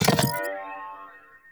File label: Hack UI Positive; Warframe; The New War; Narmer Hack